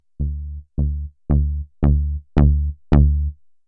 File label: bass